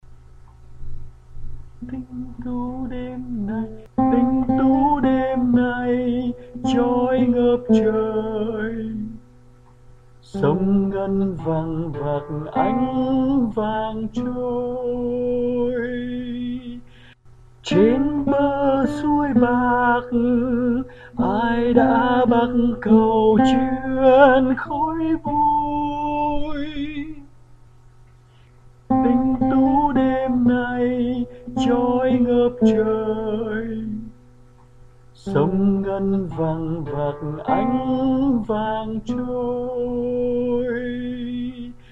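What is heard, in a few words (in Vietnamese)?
Chúa Đã Phục Sinh, Sop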